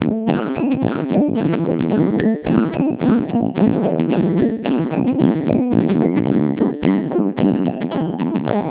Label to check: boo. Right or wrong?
Wrong